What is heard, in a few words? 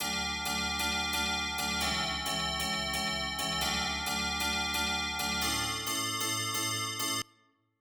A Major 133 BPM